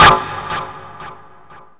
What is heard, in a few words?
ECHO; AKAI Anime Sound FX